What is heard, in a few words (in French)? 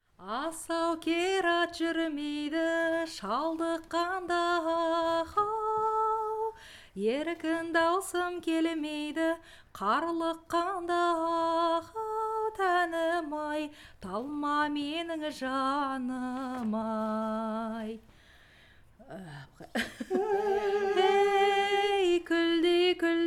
chante; bipyl